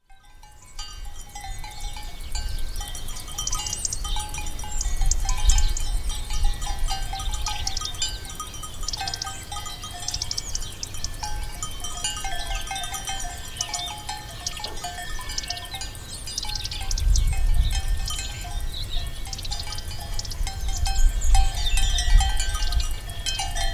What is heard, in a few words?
cowbells